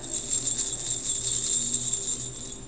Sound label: coin headdress, Belly Dance Head Scarf with Coins